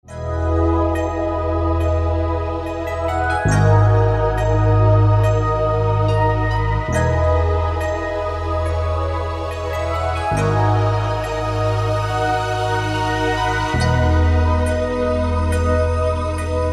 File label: e piano strings choir bass; audiokit digital d1; audiokit pro